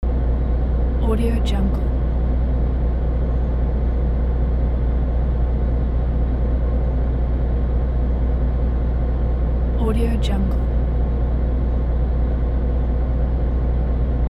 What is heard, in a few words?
truck engine idle loop